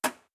Gear; Puddle

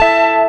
KEY Hold Loop; NYC

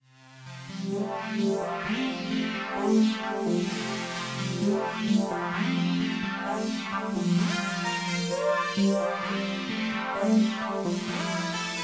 Club Theme

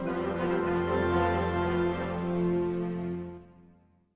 Deception End 50bpm; Music Dialogue

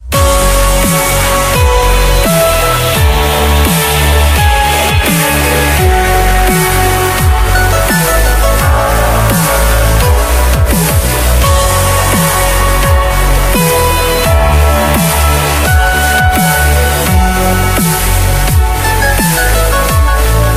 End Level; dashy square

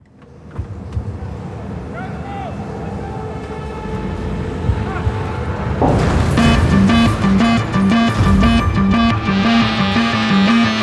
Music Loading; Battlefield 4 gamerip 2013

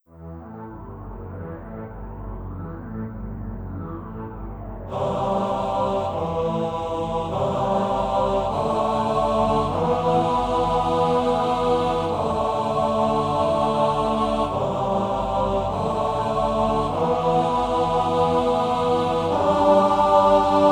Horror

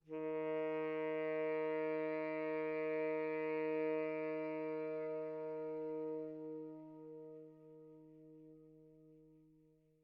Env Sky High Altitude Bass; gamerip; The Legend of Zelda Tears of the Kingdom

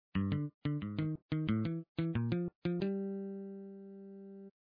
Bass fill

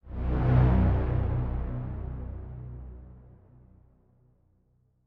Chime; chime sharp

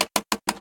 Wheel click fast

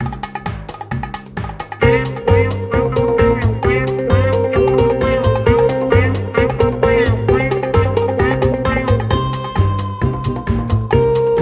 Beach; the spongebob movie game sponge on the run; ios android

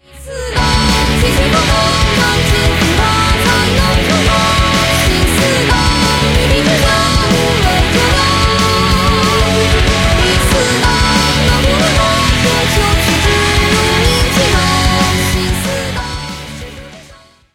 BGM xfade; Invaxion

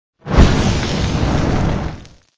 Reaction whistle

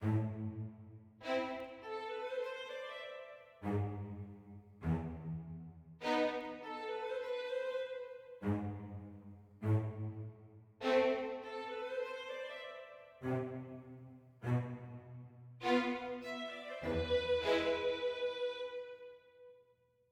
mysterious med

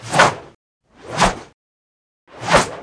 Woman; Conquer Online 2.0

